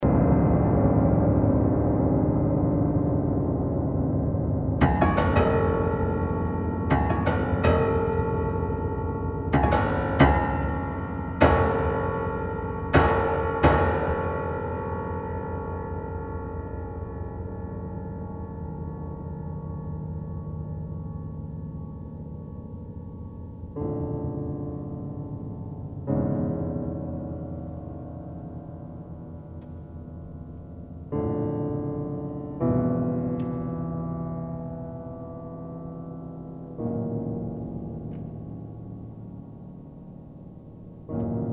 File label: PIANO DUO SONATA